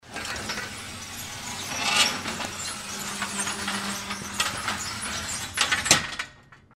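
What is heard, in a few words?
razdvijnye vorota otkr, sliding gate opening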